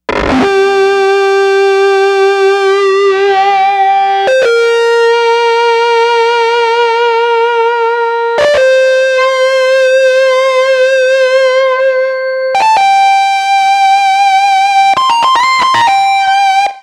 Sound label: Grunge Lead; Dr. Fink's Funk Factory